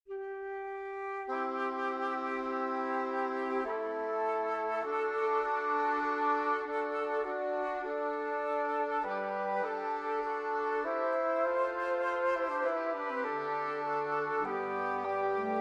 for wind quintet; Byrd, William; Rex gloriae Domine virtutum

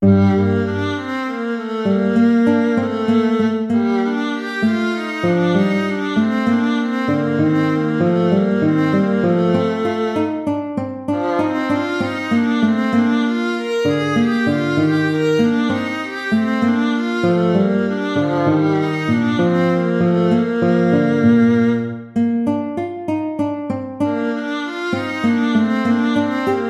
gigue

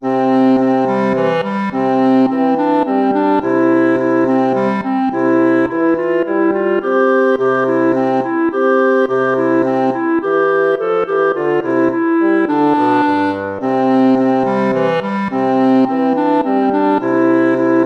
minuetto; hook james

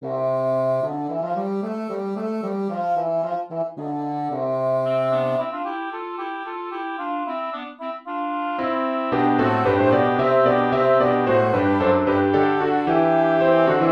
for piano woodwind quartet; haendel georg friedrich; hallelujah amen from judas maccabaus

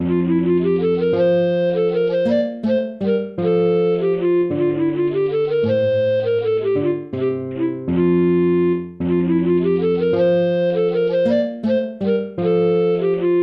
piece for lute

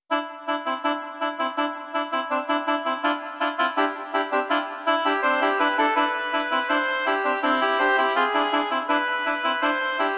divertimento animato

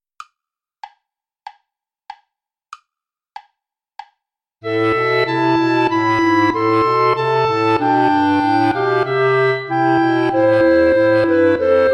play along without clarinet

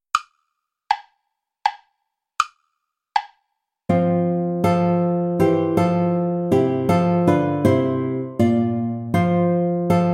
play along without guitar; herr jesu christ dich uns wend

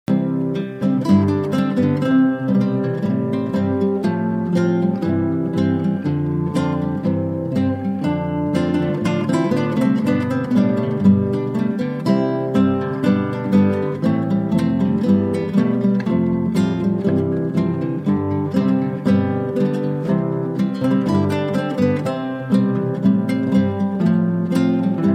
quintet

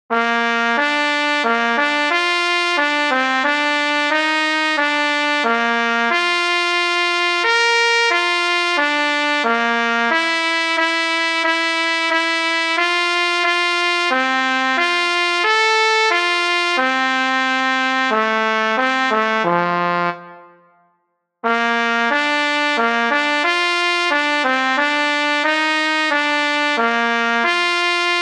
march trumpet

